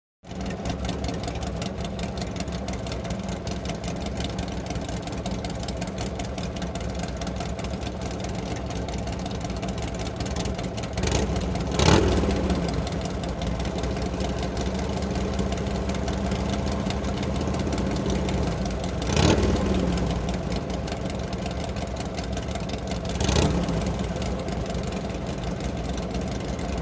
Motorcycle Race, road racing

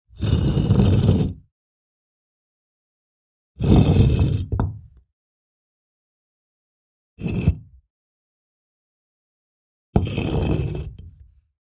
Stone, Heavy Move, Push x4

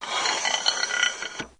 Tripod Leg Telescop

